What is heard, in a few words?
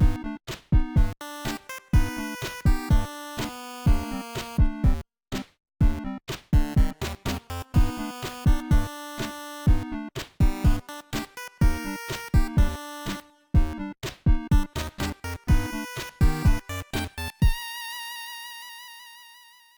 castle, music; project hawk; abeds castle